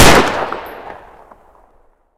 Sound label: shot, weapons; cw fiveseven